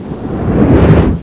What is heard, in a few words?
destruction fail